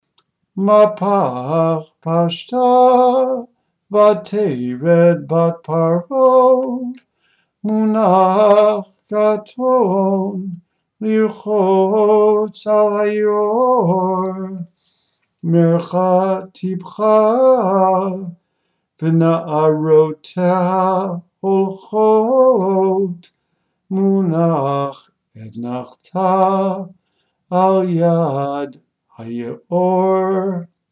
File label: Chanting Practice Verse 5a, torah trope